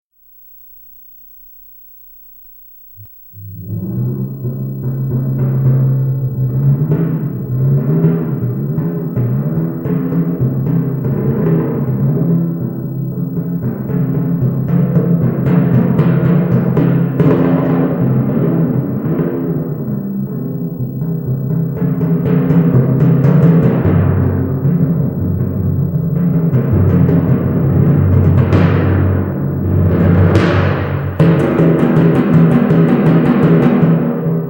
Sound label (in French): Timbales